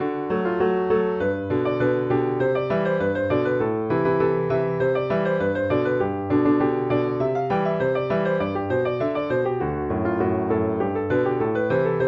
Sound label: Piano Composition Piano; Piano Composition 5